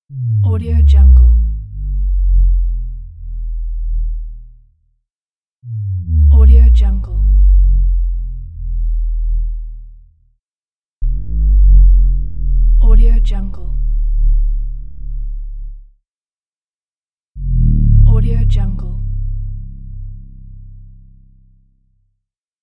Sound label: bass drop pack